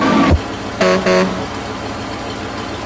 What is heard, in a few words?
horn, Firehouse; kids-tetris